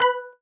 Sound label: Count End General; netent